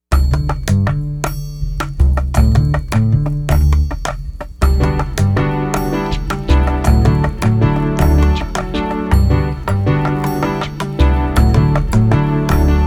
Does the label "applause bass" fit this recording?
No